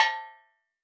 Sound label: Steel Drums Layer A5, Calypso Steel Drum; Percussion